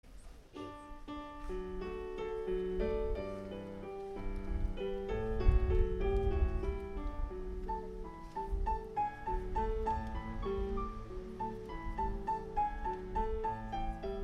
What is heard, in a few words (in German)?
Unterstufenchor Komm lieber Mai